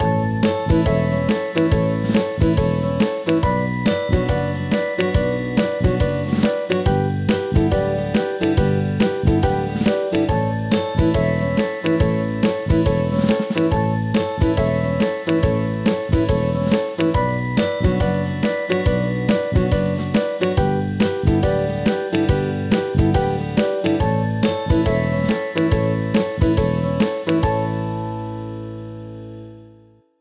happy uplifting piano

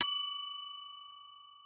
bell ding resonate

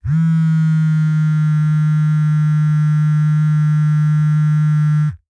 cell phone vibrate in hand

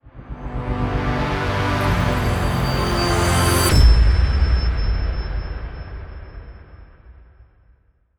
introducing logo stinger sound

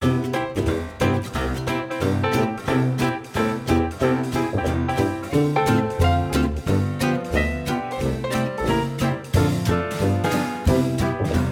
lets swing baby; audioblocks

